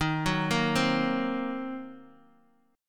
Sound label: D sharp Minor 6th D sharp down Guitar Open D